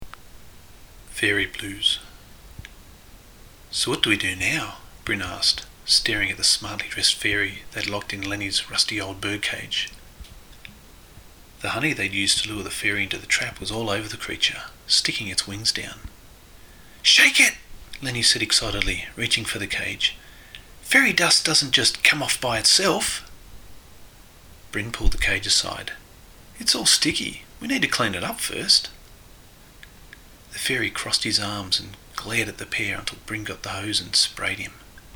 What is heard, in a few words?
Blues